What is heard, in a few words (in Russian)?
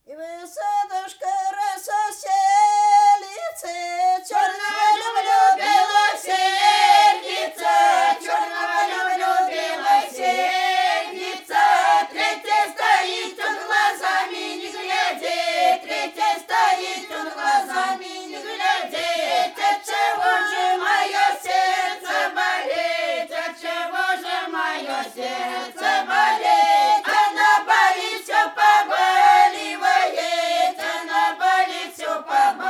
vo sadochku rosa steletsya plyasovaya; orlovskaya oblast